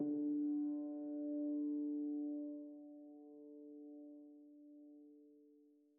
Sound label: City Reprise